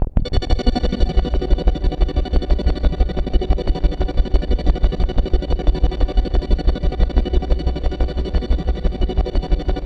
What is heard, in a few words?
C1, SynthLine; 90 s Sample CDs; NiceBeats; SynthLine VOL-7